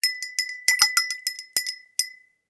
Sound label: Stir Stirrer